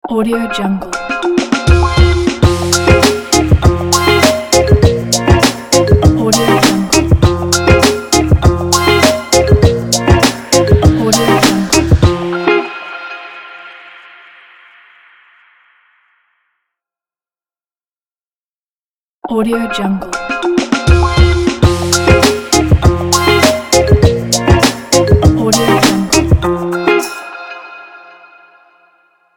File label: for positive vlog podcast opener logo